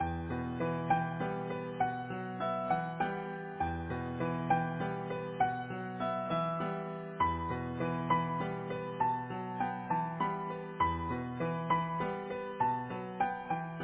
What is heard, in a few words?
Easy Piano